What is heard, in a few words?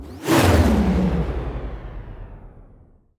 sfx sci fi; Masterbits - Switch FX